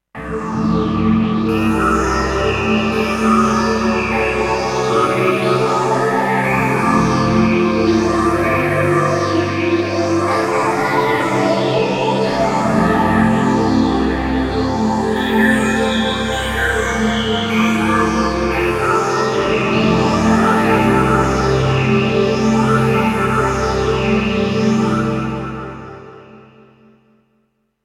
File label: S550 Pure Phantasy processed